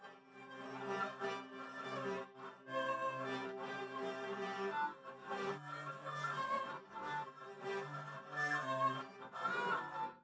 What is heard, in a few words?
no drums, 380ms 4cm; SiSEC11; underdetermined